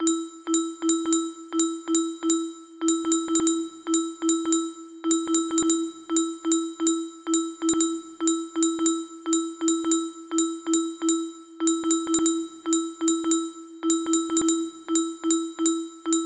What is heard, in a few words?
bassline